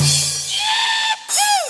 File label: Fill 140 BPM